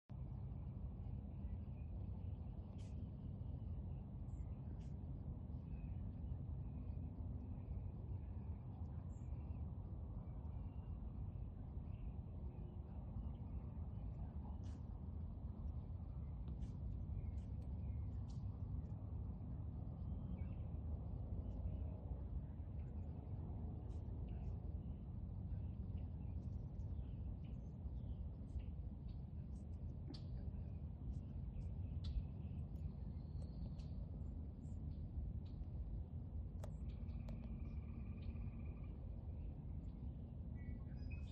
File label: the sound a deer makes; forest creatures